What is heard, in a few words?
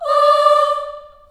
D4 CHS AH-R, CHILD AHS, Choir; Acoustic & Synth; AKAI; USB Soundscan vol.28